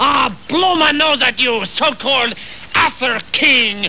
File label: blow nose